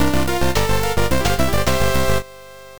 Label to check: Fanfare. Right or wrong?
Right